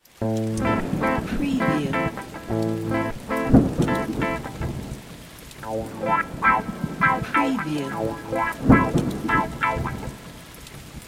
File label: RTM Bastaki Am 105BPM, electric guitar; 6-8; beatcraftpro